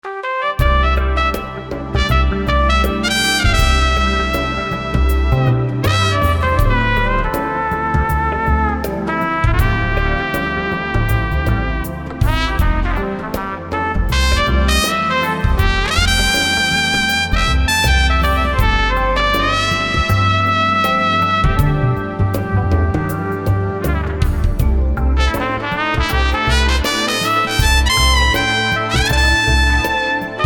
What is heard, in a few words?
trumpet